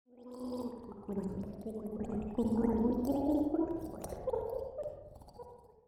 Drowning Girl

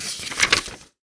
Book Page Turn

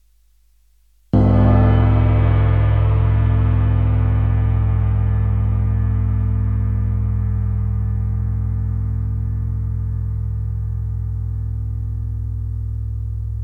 Cymbal

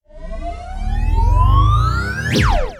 Space Laser Charging

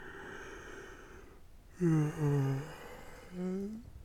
Yawning

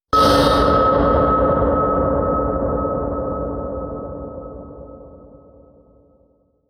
Dramatic Metal Clang; Timbre's; Improved

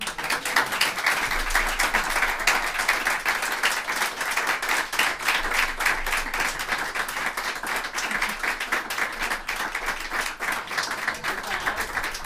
Applause Small Room

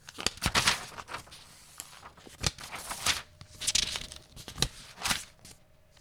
Page Fluttering Strong Wind

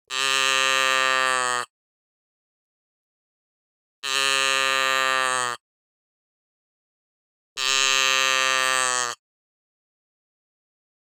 Cow Machine

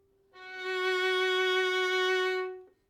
Violin F 5 Tenuto Vibrato

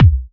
Kick

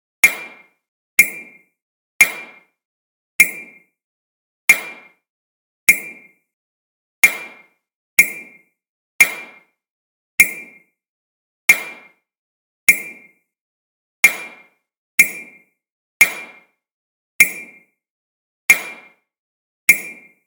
Simulated Flashbulb Pops

